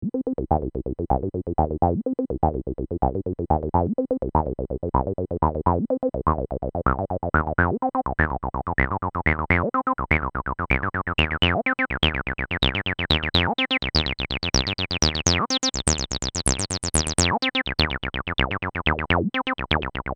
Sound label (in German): Roland TB 03 Acid Saegezahn